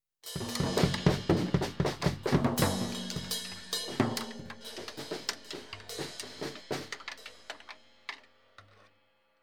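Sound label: drum kit fooling around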